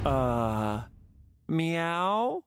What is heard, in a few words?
bonk uhh meow